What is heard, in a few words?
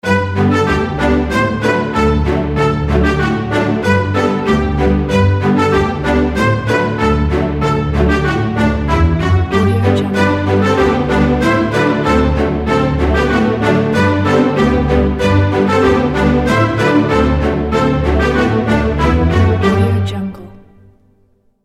mexican fiesta ident